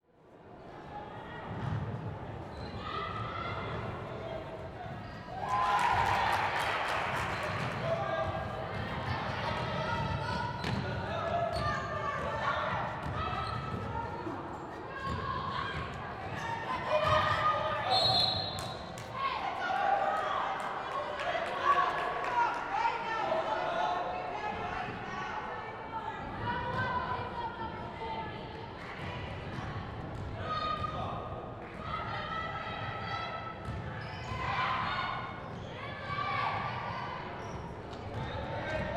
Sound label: Ambience Basketball Game Girls Junior Varsity Sideline More Action; AdobeCC